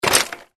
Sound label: jump bike; disneyxd; stuntastic